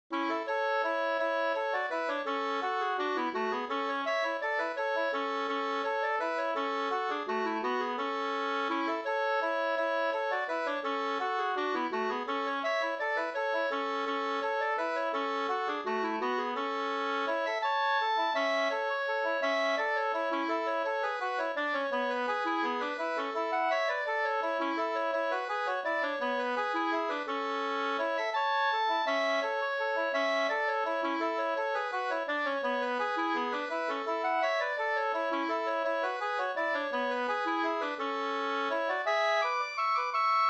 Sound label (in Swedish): vals A dur; Hammarbergs-Hans